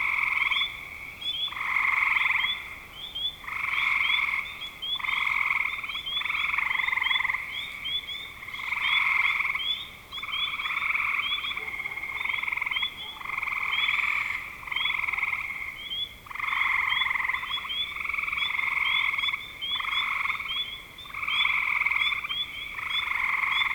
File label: spring frogs, frogs; Minnesota Frog Sounds; Michael G. Swanson